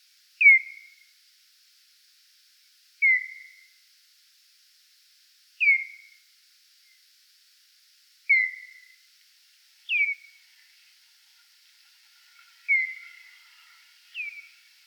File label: Noctule time expansion, bat; Philip Briggs